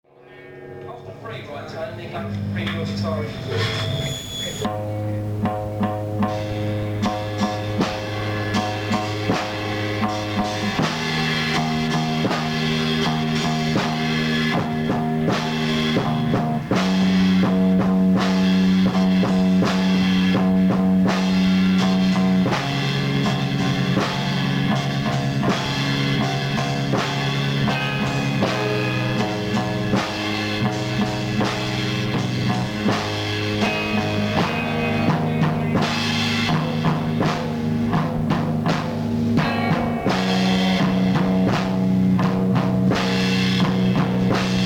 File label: Instrumental, Rehearsal